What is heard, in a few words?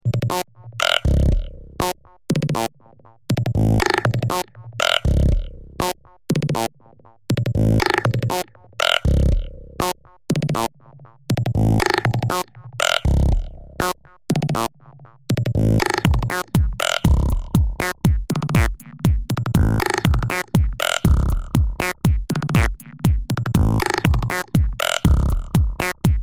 GoBox